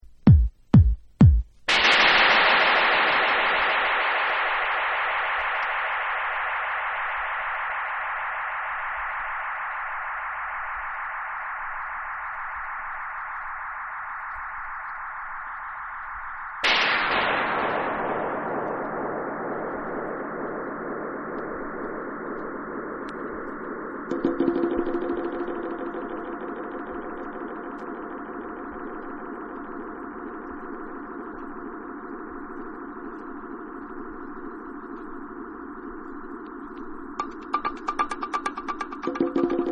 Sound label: Beats